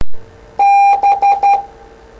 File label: Morse B